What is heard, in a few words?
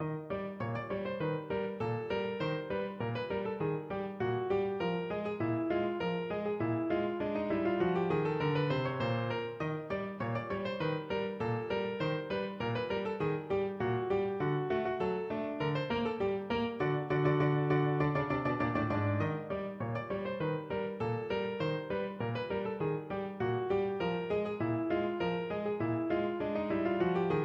PNO